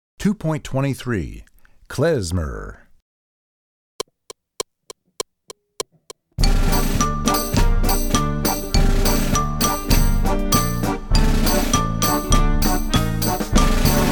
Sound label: Klezmer, Percussion; Percussion Book 2